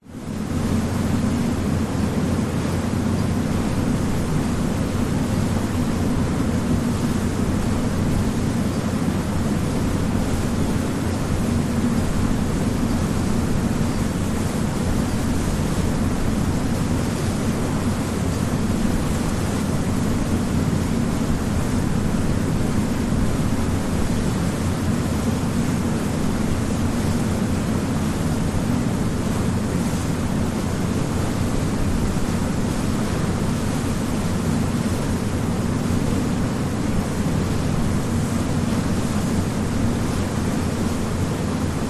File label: boat ship ferry ext rear amb engines roar waves wake; zapsplat